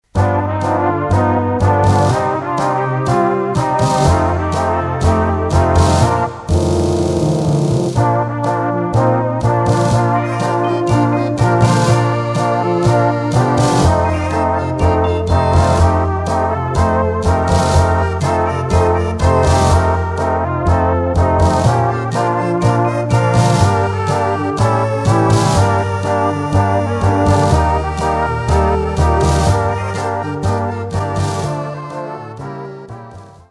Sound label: tango